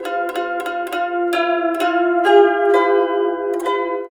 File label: SITUR, SITUR PH; Spectrasonics; Heart of Asia